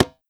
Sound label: Perc Tabla Soft High